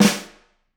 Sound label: Flam, drum